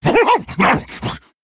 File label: male monkey, Play